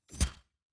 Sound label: building destruction stinger